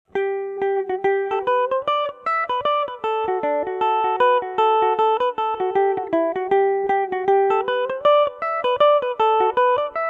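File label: G major, Irish mandolin; Up To Your Knees In Sand; Revelation Jaguar electric mandolin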